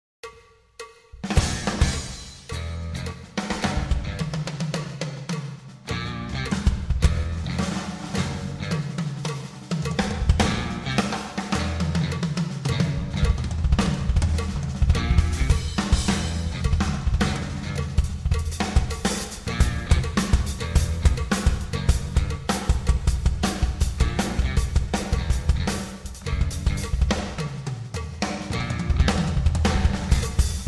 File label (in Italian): assolo doppia cassa